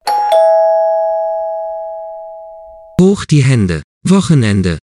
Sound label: dingdong weekend